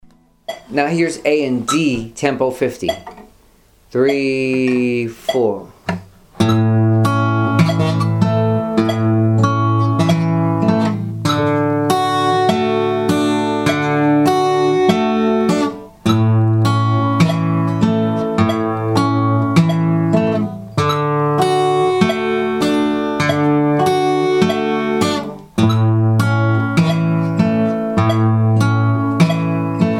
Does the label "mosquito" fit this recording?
No